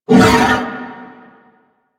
Horse Vocal Grunt Breath Short; Vocals; Warframe; Vehicles; Duviri

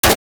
Fire Mech, Weapons; Braton Redux; Warframe

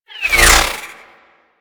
Cluster Shot Whizzby; Enemies; Abilities; Teralyst

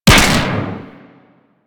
Fire Shot, Rifles; Snipetron Vandal

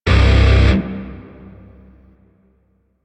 Shawzin Yo Chord A; Music; Grineer; DE; Warframe

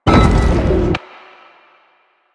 Sliding Door Chime Open; Warframe; Ambience; Nineteen Ninety Nine

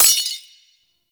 Sword Enemy Frozen; Weapons; Melee; Warframe; Orokin Weave Sword; Weave Boss

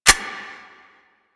Sniper Low Clip, Weapons; DE; Warframe; Tenno; Quad Sniper

